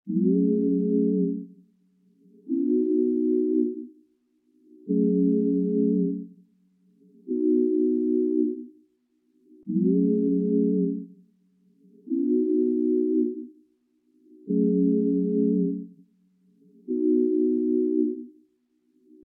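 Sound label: Afro Melody Loop C minor 100 BPM Pad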